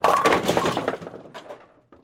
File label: lose; knex; makeTheConnection